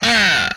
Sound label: hit, vocal; MMRetroArcadeSoundsPack